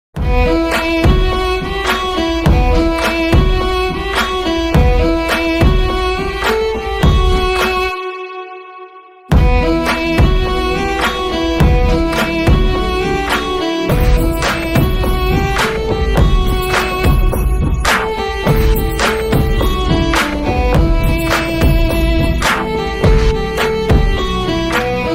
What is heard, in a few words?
bgm ringtone, telugu; rules ranjann